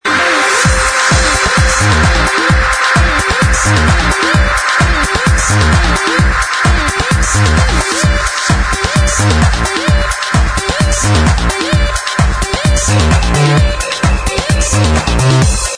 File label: Auto Disco